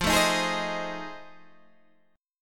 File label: F 7th Sharp 9th, Guitar, Open G